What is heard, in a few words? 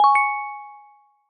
concerning, alert